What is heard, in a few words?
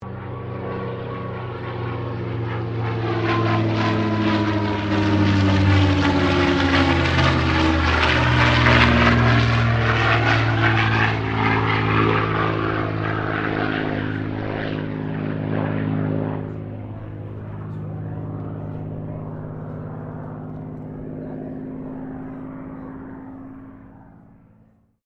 AIRCRAFT CAC CA-18 MUSTANG MK23 PASS STEREO; CA-18 /P-51 Mustang; Airplanes